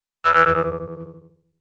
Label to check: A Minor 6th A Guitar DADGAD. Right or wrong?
Wrong